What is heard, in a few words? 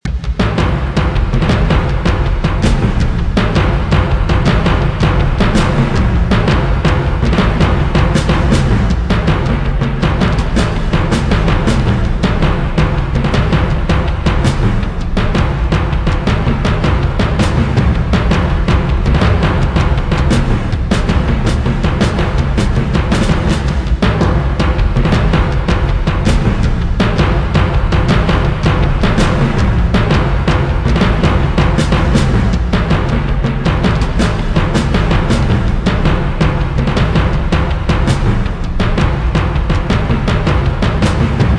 Combat Drum; Tachyon The Fringe